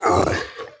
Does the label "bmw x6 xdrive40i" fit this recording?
No